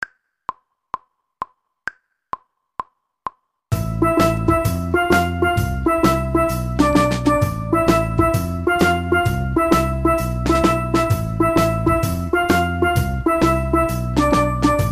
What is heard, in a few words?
steel pans; steel pans 1